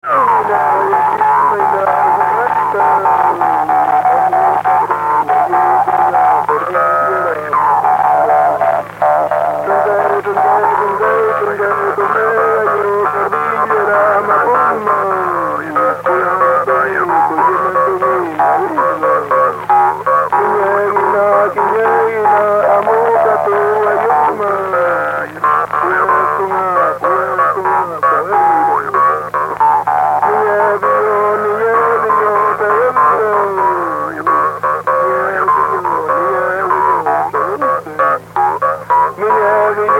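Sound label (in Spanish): Canto de hombre con trompe, Mapuche; Música Mapuche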